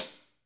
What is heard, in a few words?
HH hh; Best Service Gigapack 2